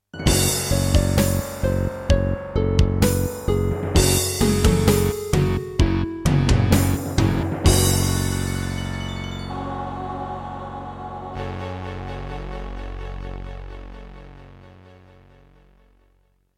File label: Roland SC-88 55MAP; sigil doom episode 5; Doom (Sigil) - Introduction